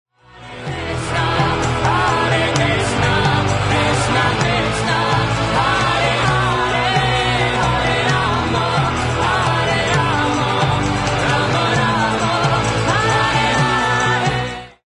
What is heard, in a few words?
Hare Krishna, Electro Punk